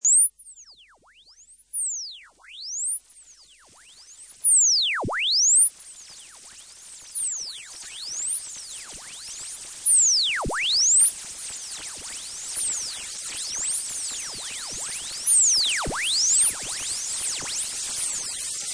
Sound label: Chirp; biomusic